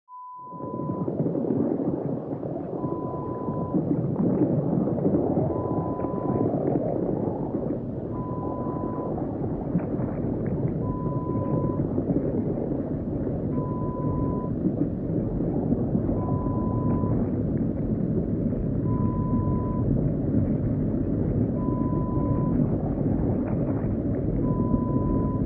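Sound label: Underwater; Lo-Fi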